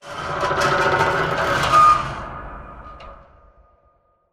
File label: metal stress med; Titanfall 2